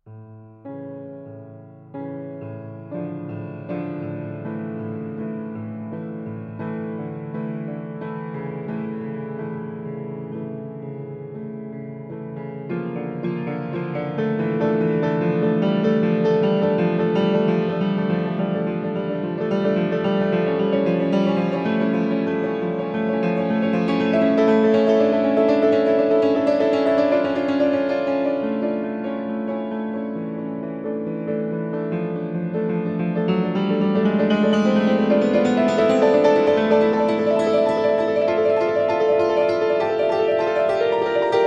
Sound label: Piano Solo